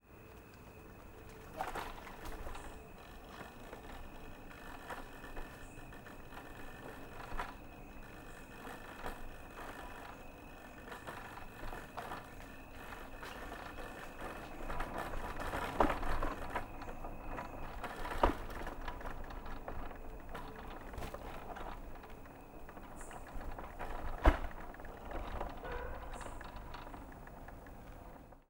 E-Scooter Electric Bike - Outdoor Side Street - Start to MidSpeed to Stop - Vibrating, Binaural; Vibrating Ride; Binaural Stereo Mics Only; The Chris Alan